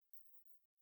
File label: stereo phase inversion 180; mozilla